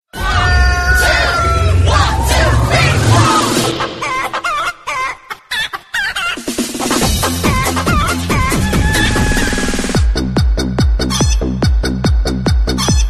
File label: blues gone hip hop